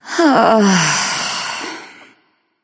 thinking; smite; isis

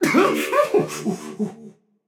ouch, voicepack; smite; jorm

